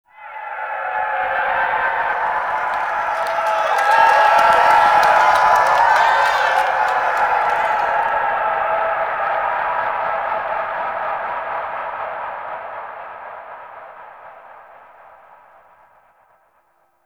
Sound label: Echoes, Ambience; Lunaro; Warframe; The New War; Zariman